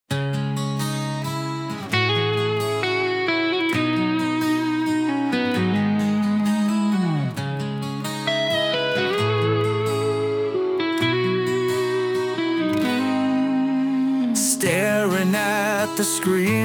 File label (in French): chant